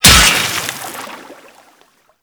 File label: Axe Impact Water; Warframe; Weapons; Impacts